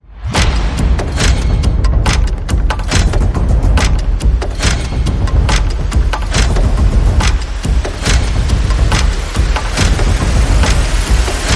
Percussion Bass and Effects

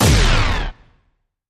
Harsh Noise Zap

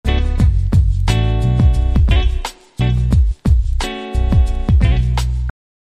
Jazzy

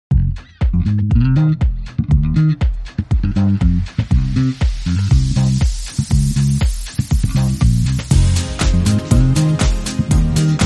Bass Gesture

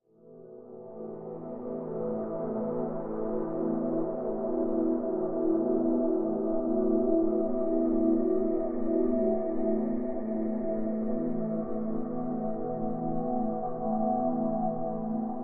Pads Bliss (A)

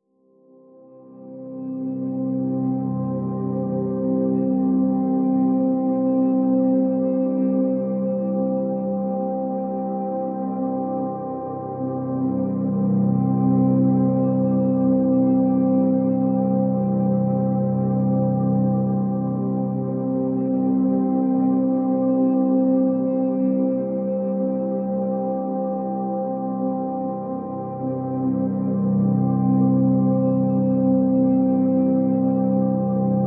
Pads C

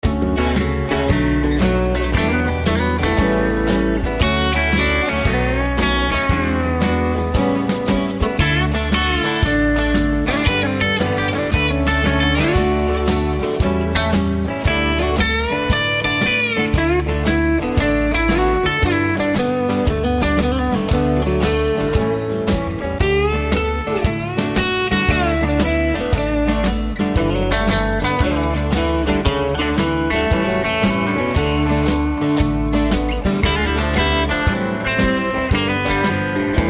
Shuffle

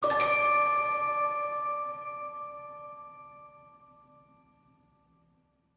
Christmas Magic Ping

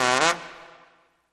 Trombone Medium Low Gliss Down and Up

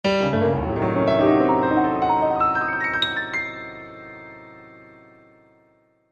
Piano Scattered About

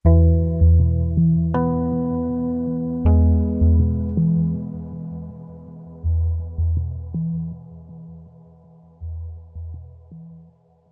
Sting

